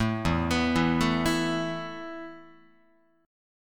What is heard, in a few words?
E Suspended 4th E, down, Guitar, 4A,4E,5A,5D,5G sharp,6C sharp; Esus4 arpeggiated